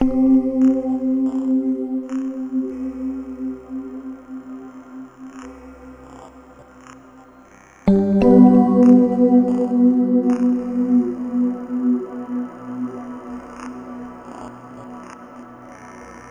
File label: Ambient Keys; Sonic Foundry (Sony Creative Software); Slow Silhouette